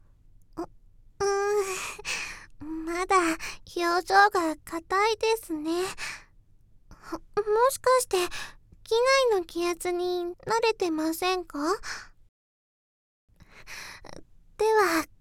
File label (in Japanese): キス; ロリ母性抱擁系CA